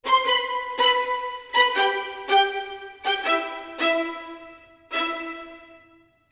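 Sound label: 80 strings hi, A minor, Orchestral arrangements; PowerFX - Downtown Orchestra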